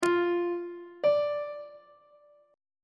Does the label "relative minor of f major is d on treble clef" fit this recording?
Yes